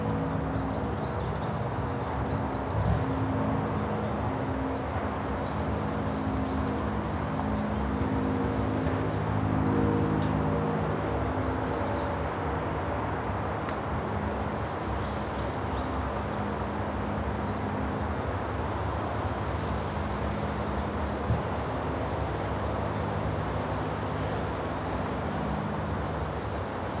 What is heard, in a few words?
exterior; inferno